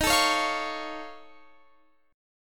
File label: F Augmented 7th D sharp, chord